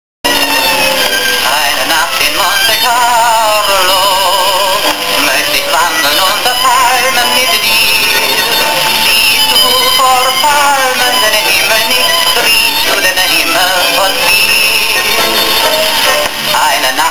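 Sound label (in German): Grammophon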